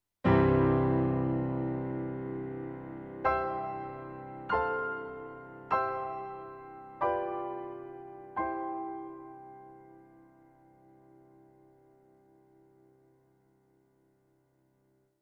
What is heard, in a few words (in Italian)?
Pedale tonale